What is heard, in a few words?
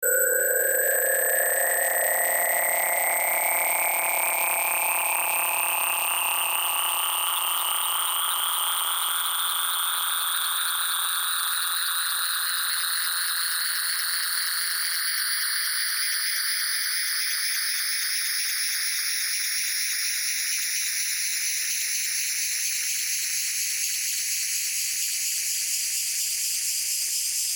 morph sine; sine4x; spectral